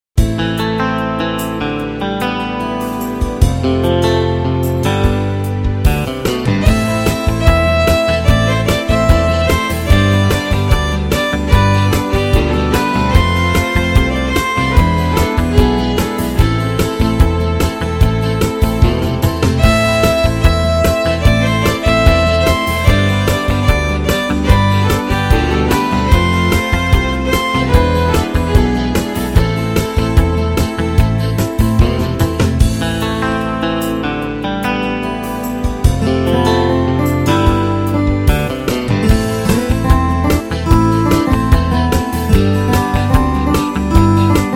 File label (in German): Country Fiddel Rechts; Country Herberts Fiddel